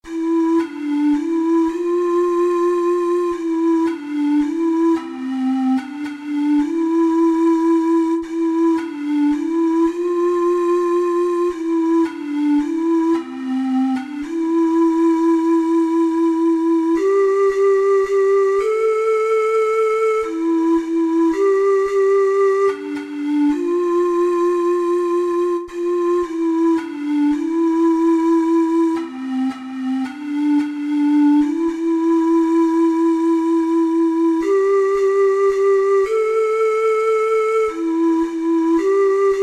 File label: be still my soul, ocarina four hole